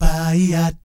BAYEEAT, Vocal Planet Jazz Fx; Spectrasonics

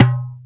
R8 PRC; E-Lab; X Static Goldmine 1

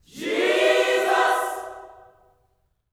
JESUS CHD, Vocal Gospel; Spectrasonics; Vocal Planet Vol.1 Gospel; akai

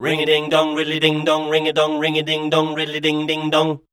SCAT #D, Voice

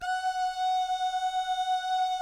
"doo" guys, Vocal Jazz Fx

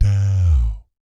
DOW G2, Vocal Planet Jazz Fx, JAZZ COMBO; Spectrasonics; Vocal Planet Vol.3 Jazz Fx

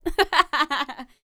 LAUGHS, Jazz Fx

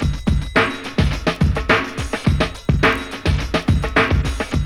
D3 BREAK

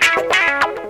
GTR RIF; INSTR LOP; E-Lab; X Static Goldmine 2; akai

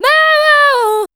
voice spectral, ly short

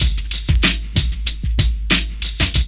LOOP; E-Lab; X Static Goldmine 2; akai

